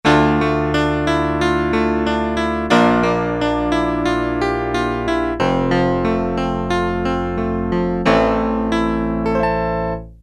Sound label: Grand Piano, Yamaha TX81Z